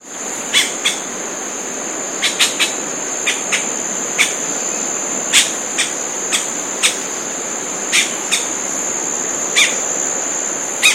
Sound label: Cacicus cela; I Comandatuba